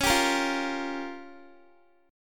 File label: Db 6th Flat 5th, Guitar; Db6b5; strummed; x,16,17,15,17,x